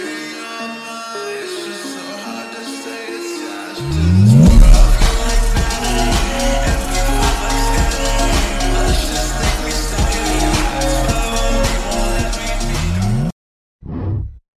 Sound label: ringtone